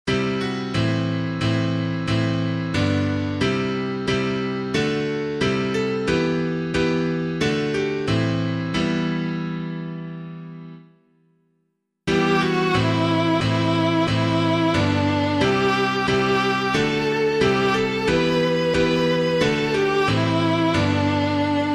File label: When Jesus Comes to Jordan's Stream, KINGSFOLD, piano, hymn